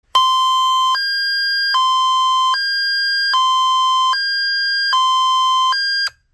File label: MS135T Fire, beacon light; meltric